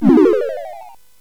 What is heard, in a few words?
SFX; Narco Police; hvsc